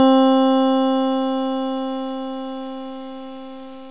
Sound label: e piano; gmplay